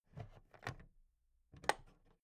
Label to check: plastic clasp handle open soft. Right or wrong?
Right